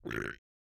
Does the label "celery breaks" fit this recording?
No